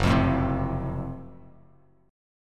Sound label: F sharp Minor Major 7th Flat 5th F sharp down Bass Standard; strummed